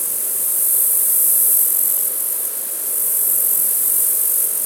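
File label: Ambience Cicadas Loop; moul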